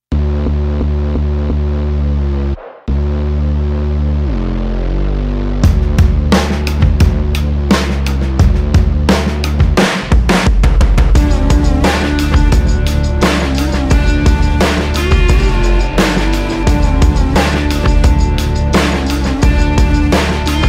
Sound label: DIRT SONG With GTR